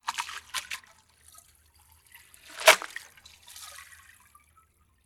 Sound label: Drops